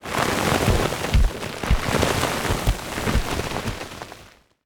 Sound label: combat atmosphere; World of Tanks